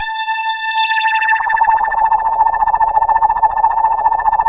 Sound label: JELLO, KORG DW8000, Vintage Keyboards; Big Fish Audio - First Call Vintage Keyboards